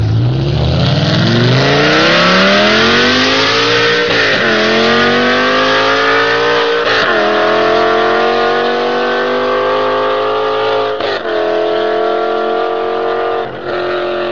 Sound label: bmw m5